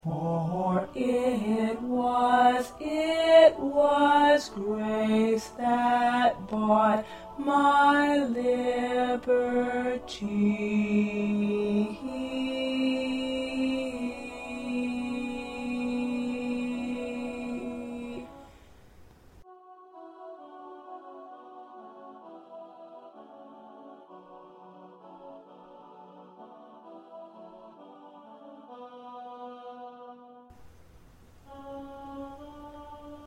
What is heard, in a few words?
1st Tenor